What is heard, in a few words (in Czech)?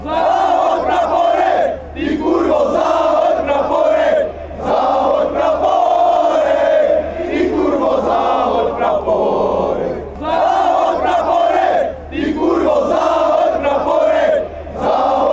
zahod praporek, chants